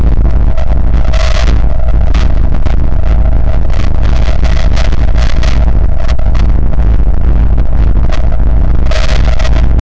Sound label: distorted siren looping; emergency